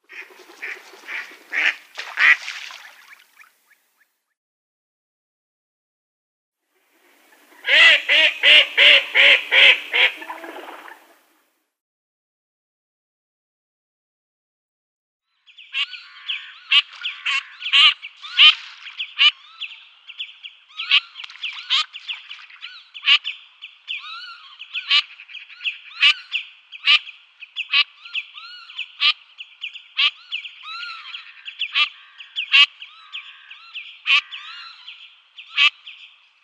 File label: Common Teal females